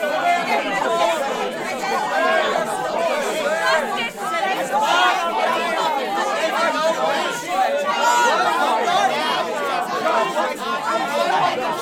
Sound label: Upset Crowd Talking Loop